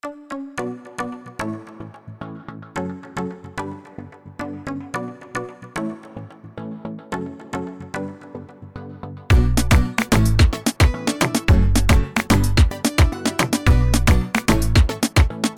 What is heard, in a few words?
urban reggaeton sample kit